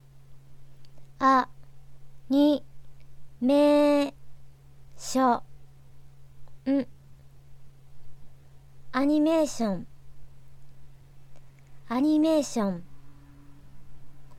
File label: animation